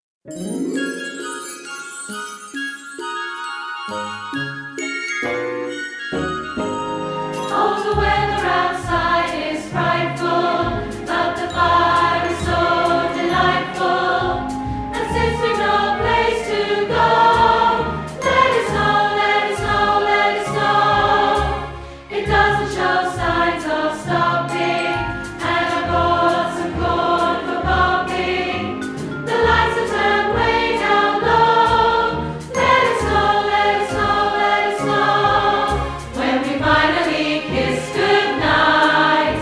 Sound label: SOPRANO